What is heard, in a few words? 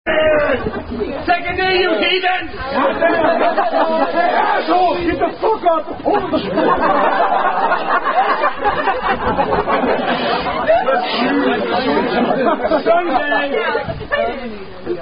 Rugby Drinking Songs; Day Of The Week Song